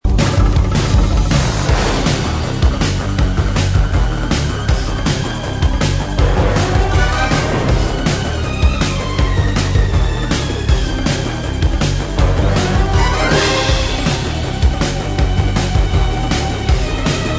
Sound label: loop; Danger Mouse - Ultimate; world 2